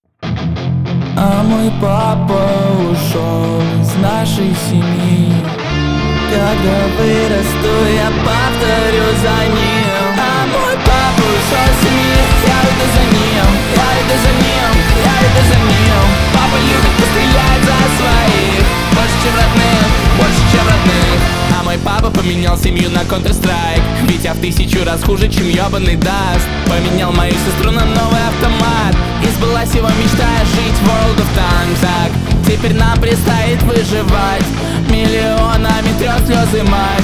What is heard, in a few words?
semi overdrive version